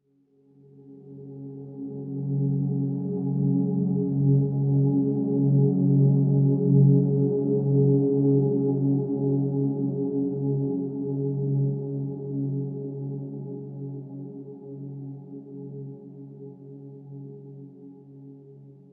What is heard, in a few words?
Swell Tone; HDD Theme Scull Swell Tone 03b; inside